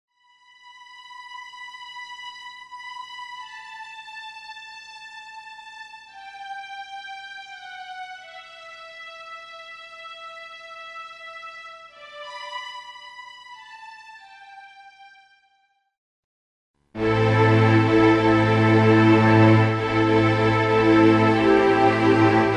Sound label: Miserere for strings